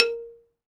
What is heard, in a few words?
XYLOPHONE, Percussion; ILIO